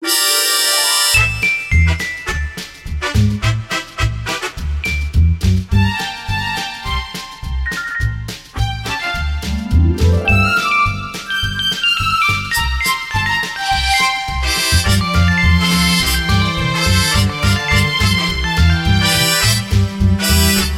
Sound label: GuitarMusic